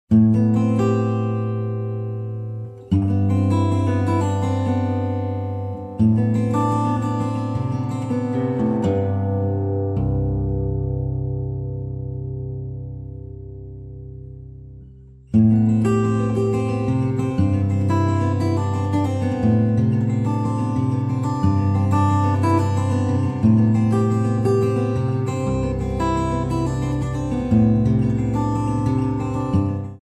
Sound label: waltz, geetar; golay maine waltz